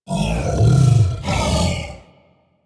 Juggernaut Truffle Found, Infested; Warframe; Truffle Hunt

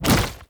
Tank Infested Arm Move Fast; Warframe; Lasrian

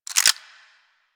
Daikyu Bow Fully Charged; Tenno

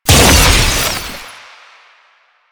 Explosive Impact, Weapons; Warframe; Acceltra Prime

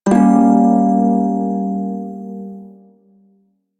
Prime Shawzin Phrygian Chord H; Music; Warframe

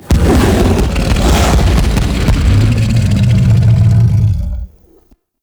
Monster Acid Spit; Warframe; Infested

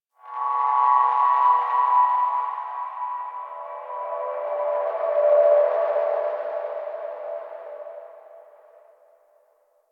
Texture One D, Ambience; Warframe; Rail Jack

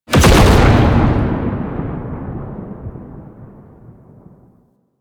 Alchemy Transmuter Despawn; Warframe; Entrati

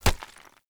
Unarmed Punch Impact Rock; Weapon Impacts; Player; Environment

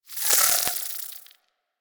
Inf Uzi Proj; Warframe; Infested

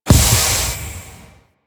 Organ Air Blast; Ambience; Warframe; Orokin Moon

